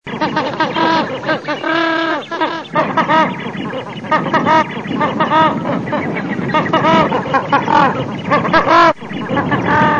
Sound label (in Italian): OCA ARGENTINA, birdsound